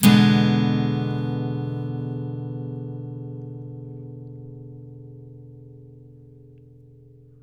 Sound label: Blues Guitar, BARI CHORDS; ILIO; Fretworks